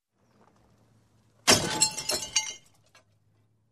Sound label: Broken Tile Sound